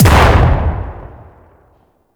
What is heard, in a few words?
Quad Sniper Shot; Warframe; Weapons; Tenno